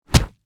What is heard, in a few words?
Impact Punch Body, Impact, Punch, Body, Hard; Punches and Hits; Combat; BlastWave